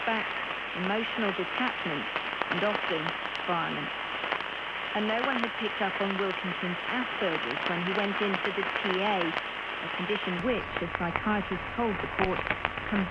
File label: Radio BBC 198khz AM